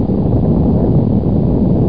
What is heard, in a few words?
g shake; CD Action 47a 2000